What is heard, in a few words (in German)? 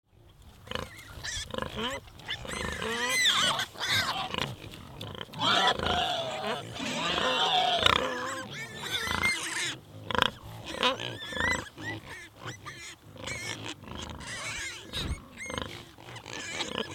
Wildschwein Rotte am Futterplatz; artenkabinett